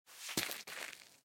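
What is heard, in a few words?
gravel foot movement; A Western Drama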